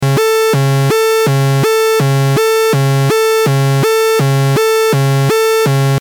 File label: 8th accent after, synth